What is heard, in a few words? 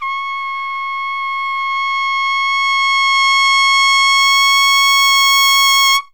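C#, crescendo trumpet; Zero-G; Phantom Horns; CRES.TRUMPET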